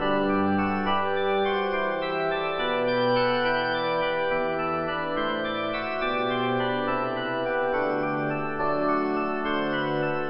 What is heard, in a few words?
radio country Organ Bach; Spider-Man 2 The Game